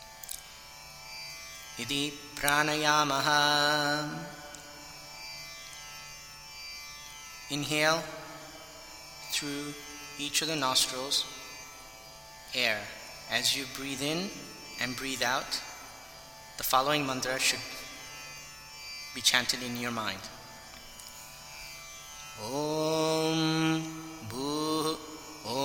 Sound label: pranayamaha; puja vidhanam; pancha upachara puja